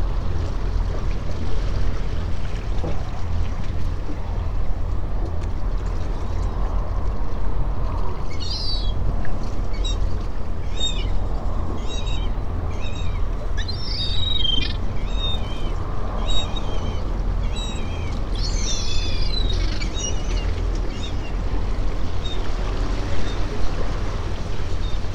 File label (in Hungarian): sarki siraly hangja